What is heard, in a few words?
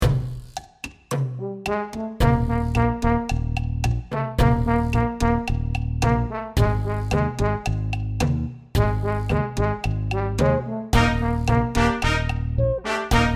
cha cha cha